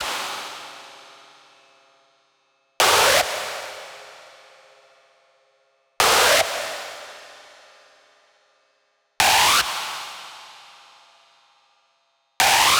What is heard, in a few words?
Screech G 150BPM, Rave; Techno; Melody Kits; Stems; GhostHack; Ghosthack - 7 Free Rave Melody Kits 2025; Kit Chaos - G 150BPM